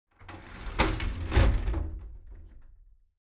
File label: shower slide door inside close regular